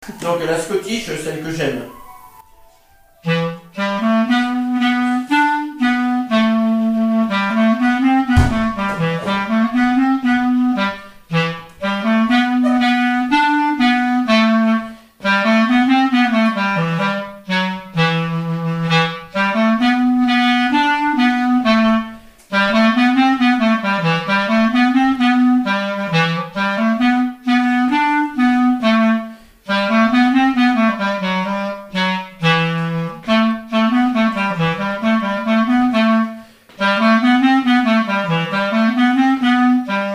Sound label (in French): scottish, clarinette; celle que j aime